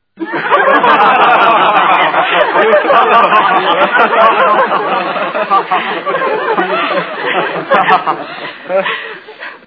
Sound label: crowd laugh applause; podcasting